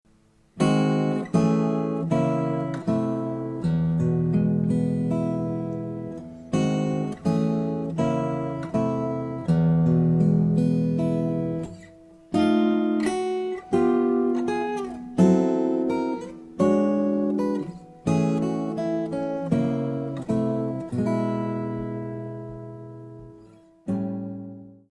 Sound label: Jazz